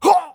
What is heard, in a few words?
hit; Escape from Tarkov; scav4